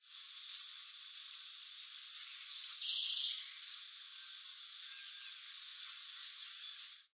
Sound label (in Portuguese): figuinha-de-rabo-castanho